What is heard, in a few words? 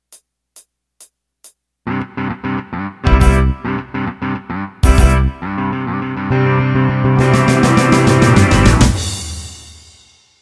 Blues Shuffle Intro